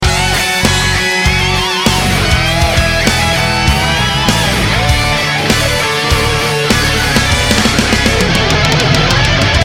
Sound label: rock metal